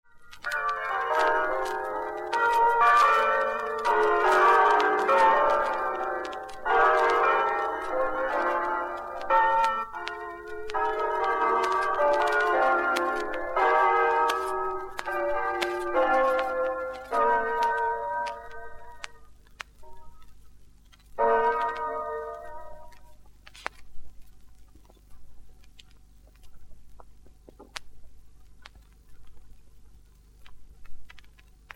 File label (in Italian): Campane